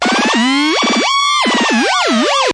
analog alarm siren; Electro and Synthetic

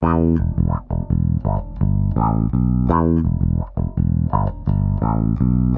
wah funk bass

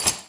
Clangy Crunchy Foundsound Kit

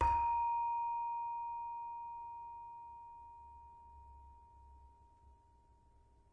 Saron, Indonesian Saron; WORLD

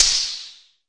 electro perc; Hip Hop; STYLE KITS (Hip Hop, Etc); Precise Electro Percussion

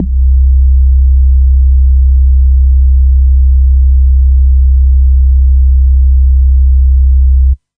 pure, synth

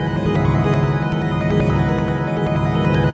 big piano synth fx; Pads

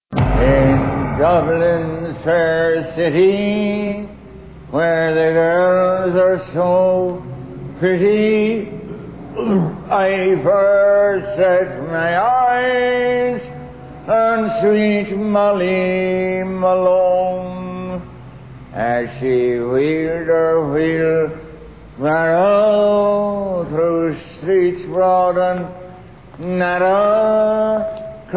drunk irish singer

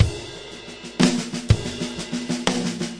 six eight fill, 100 to 105 bpm

